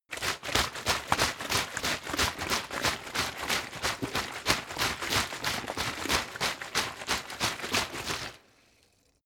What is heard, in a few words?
bottle water sparse shake slow; gastronomie; berufe